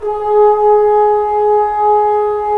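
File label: brass trombones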